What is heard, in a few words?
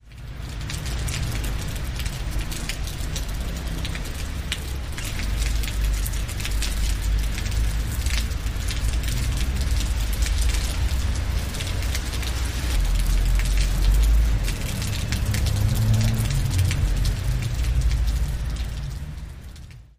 rain on eaves, rain